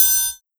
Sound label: Bell Triangle